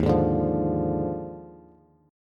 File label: Eb Major 7th Suspended 2nd Suspended 4th Eb Bass; strummed; 11,11,12,10; EbM7sus2sus4